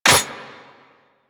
Auto Crossbow Mech; Warframe; Tenno

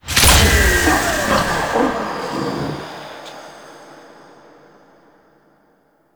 Galleon Console Activate; Warframe; Grineer Galleon; Vor Galleon Fight

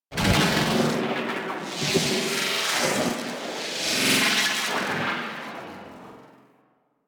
Transferrence Glitch; Warframe; The New War; Quests

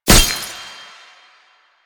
Tank Weakpoint Impact; Enemies; Warframe; Lasrian

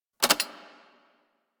Mech Release, Weapons; Tenno; AK Bolto Prime

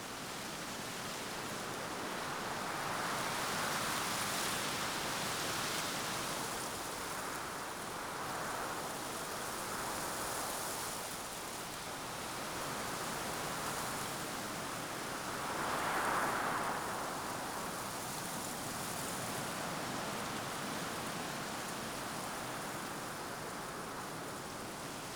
Prairie Day Amb Loop; Ambience

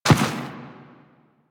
footstep dirt; Enemies; Warframe; Teshin; DE

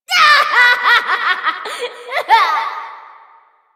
Laughter; Warframe; Entrati; Evil Twin

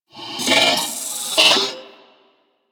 Purgatory Warrior Possess Scream, Barks; Warframe

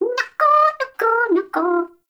baby vocal singing; Nokko; Warframe; Nokko Colony; Find Nokkos

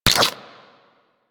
Inf Punch Life Steal D; Warframe; Weapons; Infested; Inf Kick Punch

